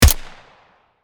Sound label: M4 assault rifle single shot; gfxsounds